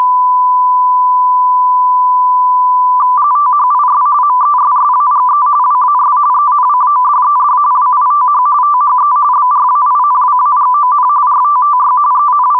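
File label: radio teletype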